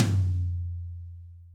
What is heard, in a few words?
drumset d#; Golden Crayon Sounds